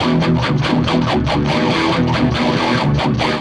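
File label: Music Confirmation box Loop; Crayola Scoot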